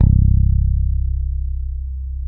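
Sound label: Sadowsky 5 MUTE C1, Bass; Sampleheads; Will Lee Bass Library; SADWSKY5MUTE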